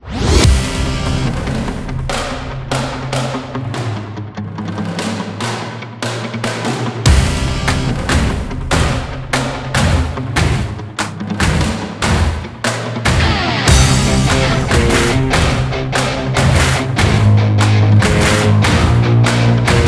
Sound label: BGM PVP